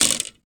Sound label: metal spring; wood metal SFX